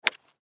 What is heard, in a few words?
Golf Club Swing, Golf Metal Driver Swing Impact Shoe Mud Squish; Impact Light